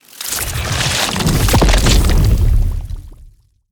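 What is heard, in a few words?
skill poison explosion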